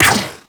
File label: Drone Laser Fire; Artificer Drone; Warframe; Corpus; Super Moa Drone